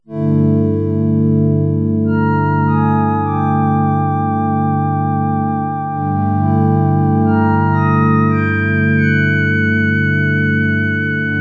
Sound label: pad, yamaha tx81Z; sounds like a 90 games; lucasgame